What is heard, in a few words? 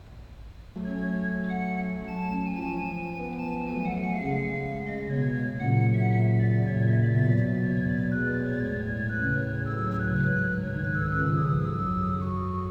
dagincour nasard